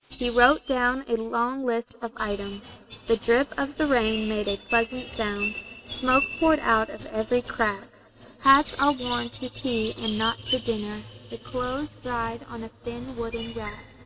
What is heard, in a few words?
birds